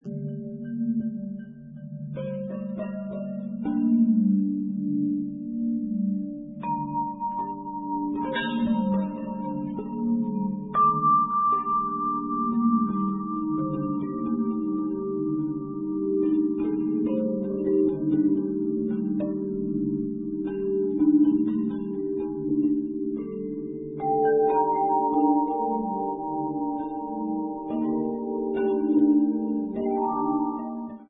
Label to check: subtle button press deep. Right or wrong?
Wrong